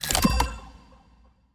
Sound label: On Pull; IntegrityTool